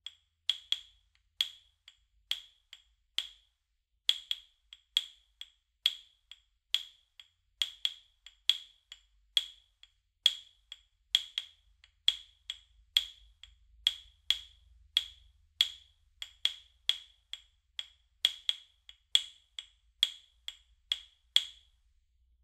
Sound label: Wood Claves Small; NINO